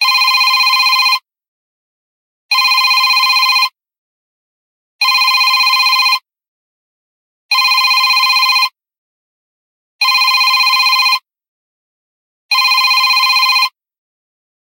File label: Ring Digital, ringtones; LG GT540 Optimus